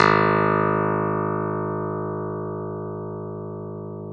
Clav G#2; Keyboards of The 60's and 70's

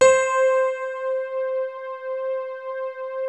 BALLAD C4, E-PIANO; Music Store - Claudius Diemeter Schwerlos

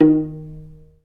Vla Piz D#2; Multisamples; Digital Sound Factory Virtuoso

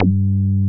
MOOG MID G2, ANALOG BASS; Music Store - Claudius Diemeter Schwerlos

